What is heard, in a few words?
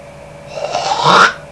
HOCK BEE